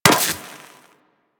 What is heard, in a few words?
Laser Impact Metal; Surface